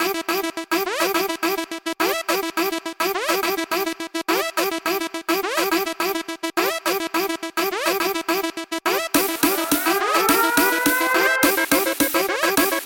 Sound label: Kit Loop Full